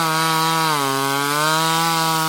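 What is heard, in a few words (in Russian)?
chainsaw single long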